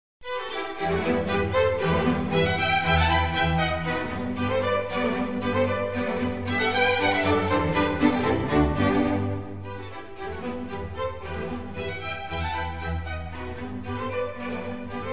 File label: Concerto for Strings in E minor; RV124; Antonio Vivaldi